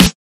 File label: 2000s subtle reverb steel snare drum f key, snare sound tuned to the F note